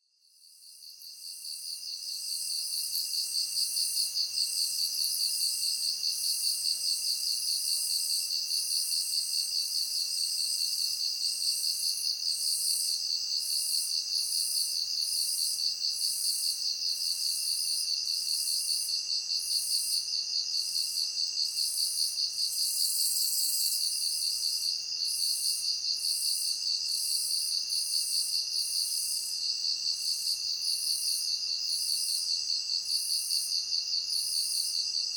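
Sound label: katydid; yamayabukiri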